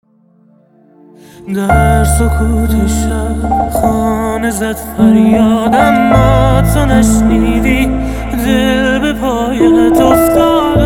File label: Piano